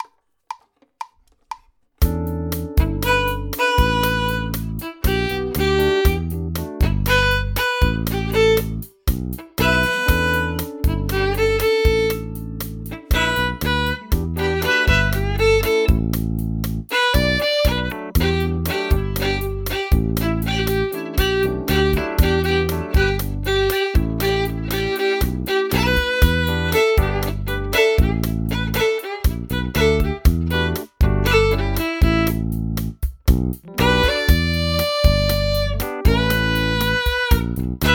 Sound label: Busy 5 Note Solo